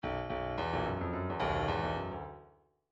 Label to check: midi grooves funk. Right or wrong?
Right